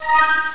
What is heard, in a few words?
schwing; yoda; PC Gamer 44